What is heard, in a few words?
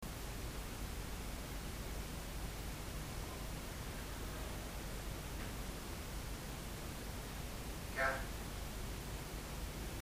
empty cellar